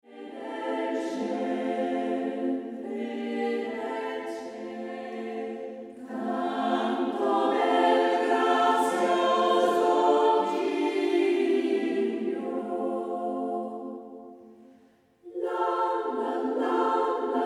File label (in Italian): Dormi, dormi bel bambin